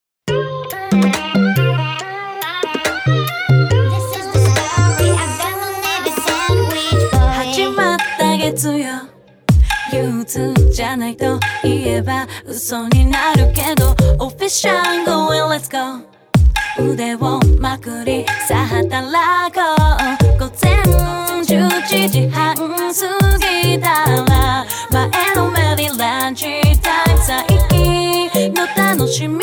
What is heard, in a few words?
chorus ver